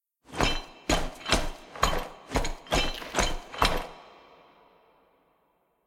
walk workout jumping jacks phase

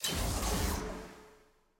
click; skin viego

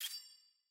launch hover

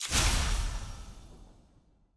burst; challenger

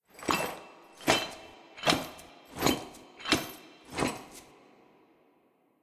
sfx br anim walk lr normal

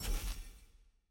card hover; champ select; aram

